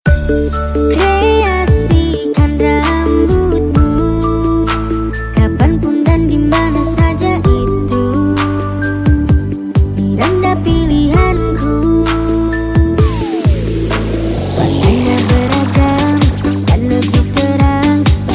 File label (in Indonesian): Jingle